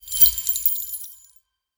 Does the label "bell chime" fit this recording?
Yes